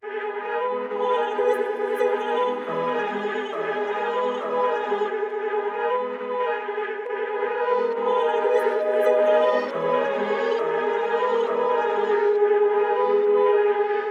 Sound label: 136 BPM G# Min, Hip Hop Melodies, Reverse; Voices In My Head